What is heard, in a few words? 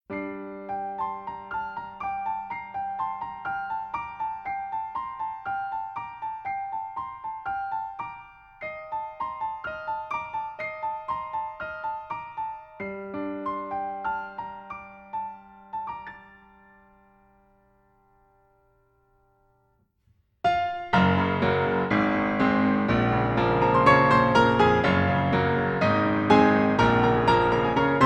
Rainy, rainy days (piano ver.)